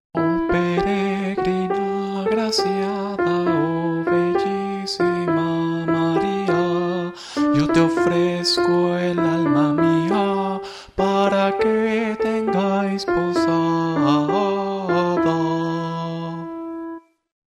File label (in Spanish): voz